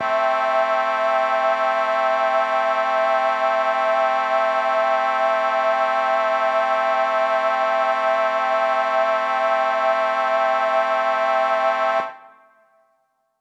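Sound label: Mixed Winds A minor 7, synth orchestra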